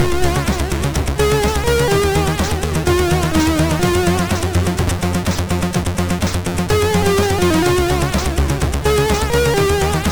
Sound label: Outrun Remix, MOS6581R2